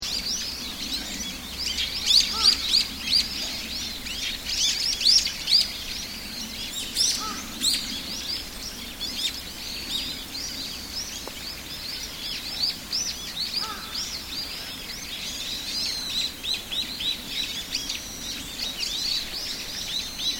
Bird Crows Temple Area Sannohe Spring, Japanese birds; Animals